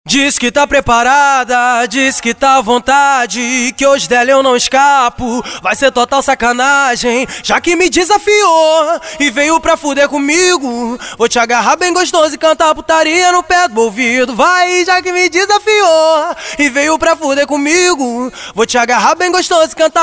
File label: acapella